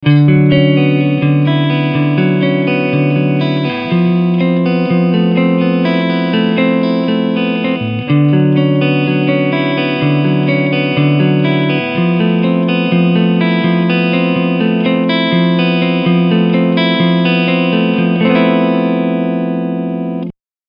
Clean Picking Front SC

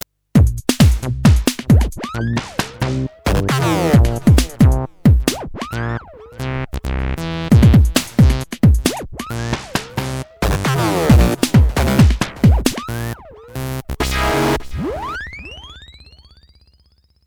filter synth drums

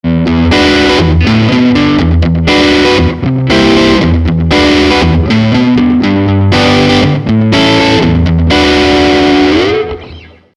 Ibanez AF-75 NP OD

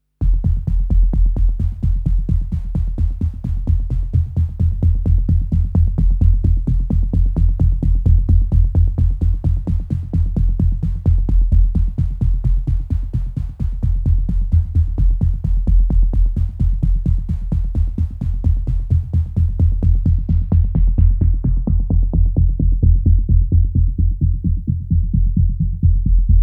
Noisy Sub Filter Kick

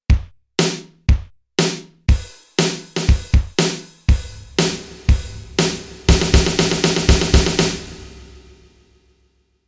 Rock; KOPRO+

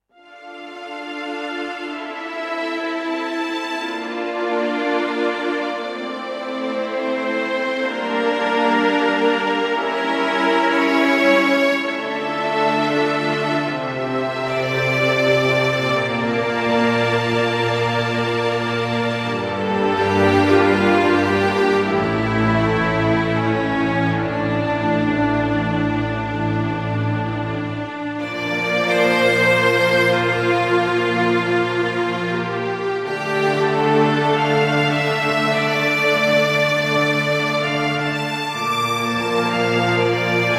Full Strings

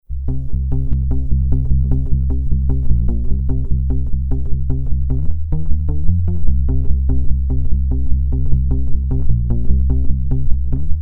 Bass Disco Finger

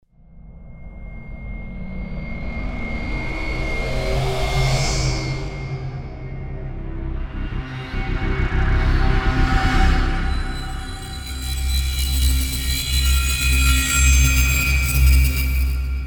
Stings Elements Reverses and Sweeps; Natural Forces

